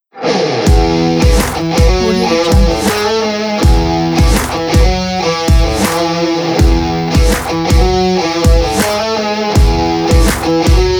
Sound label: guitar riff variety pack